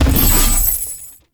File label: Shared Fate Chain Stop C; Warframe; Warframes; Pax Duviricus